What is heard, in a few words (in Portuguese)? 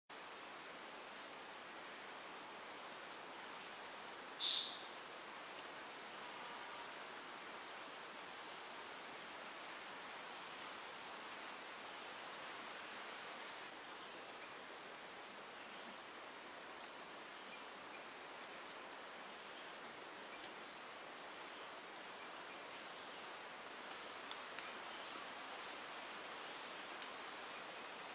Canto do Cabeca encarnada